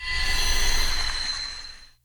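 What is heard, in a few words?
ancient sword, attack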